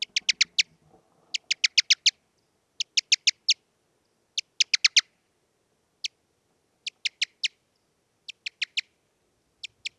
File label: EFus near receiver